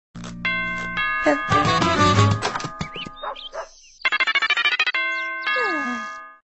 epic doorbell; double ds

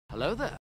haha sound funny; star meme

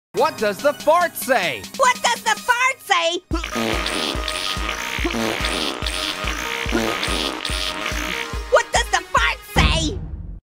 fart

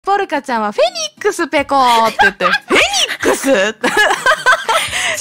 polka

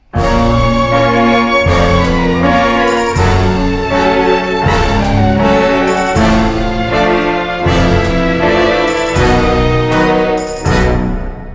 beatdrop

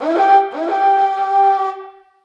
warhorn

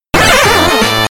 gamble failure; mario party advance

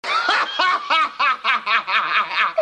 laugh; money pit

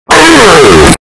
thwomp sound effect ear rape

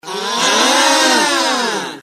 aaaa

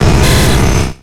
magnemite

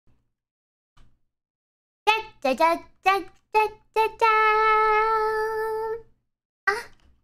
noise; gura

